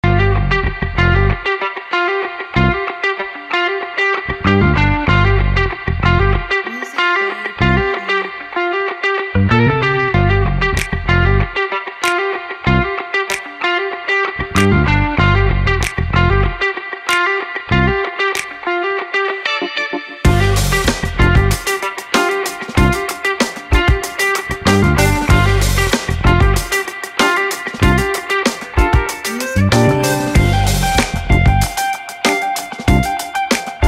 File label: Fast Action